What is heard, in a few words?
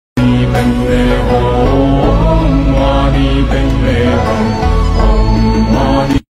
Buddhist hymn; سرود بودایی